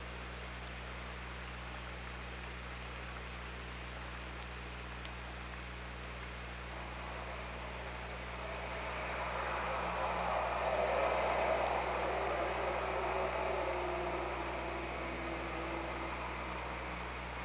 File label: OrcaLab